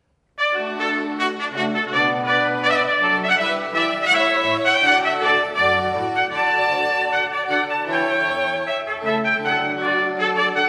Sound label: Trumpet Voluntary, String Quartet 2 Trumpets; Boyce; Classical